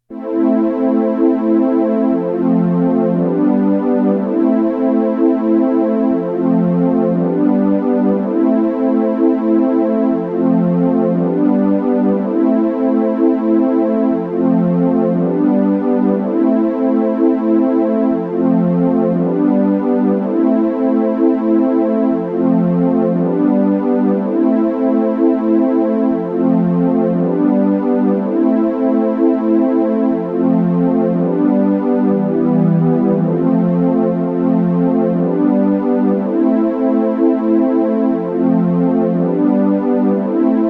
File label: pad